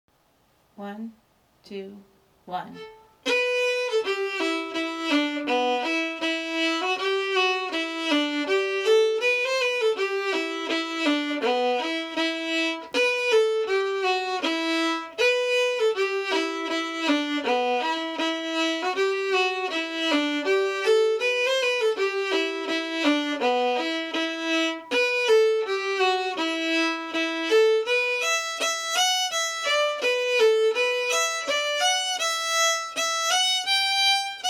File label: polka